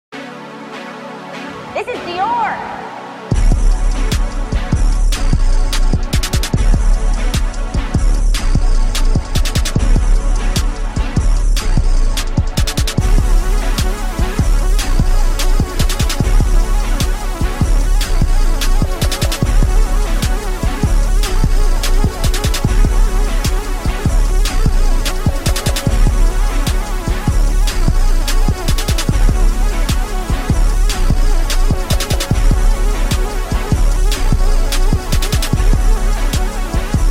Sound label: Instrumental type beat